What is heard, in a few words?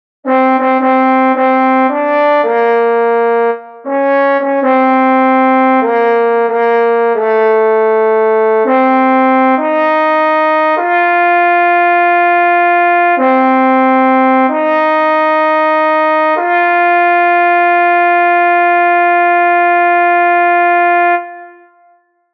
Lead, barbershop tags